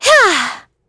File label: Vox Attack; kingsraid; en